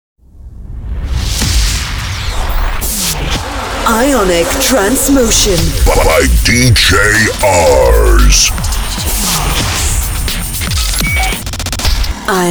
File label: ionic trance motion; Clubs; Jingles